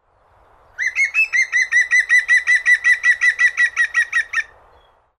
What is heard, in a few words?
green woodpecker